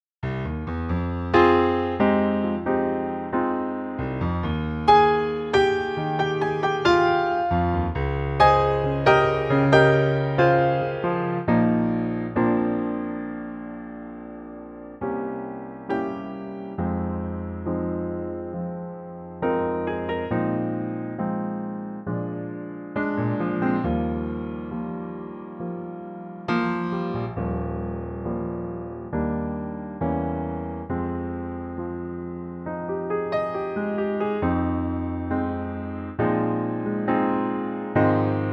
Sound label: piano